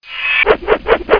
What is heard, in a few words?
ROTATE; PSION CD 2